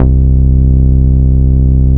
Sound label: Dance Floor Bass, Multisample Basses